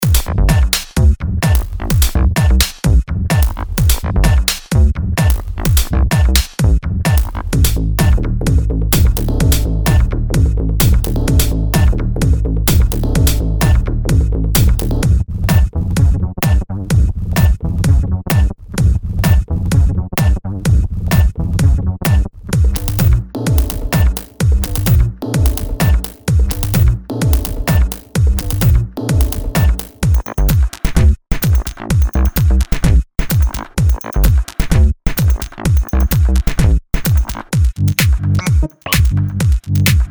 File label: tech house tops; micropacks